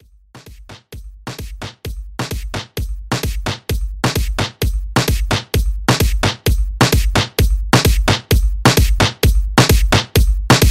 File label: Moombah Dance 130 BPM